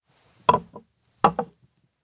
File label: miso place bowls two; anemoi